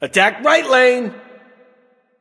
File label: Attack; Grand Slam Hercules